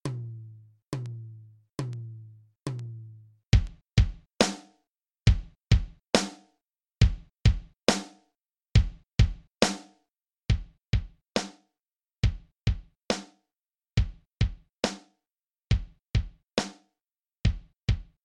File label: Power Rock, Drum Set; Drum Soundtrack; Practice music SilverGate